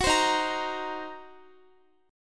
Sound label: D sharp Minor 6th, Guitar; D#m6; strummed; x,x,16,17,15,17; 4E,4A,5D,5G,5C,6F; chord shape